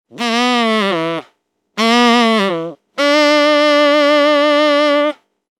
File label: Yeah Oh Sax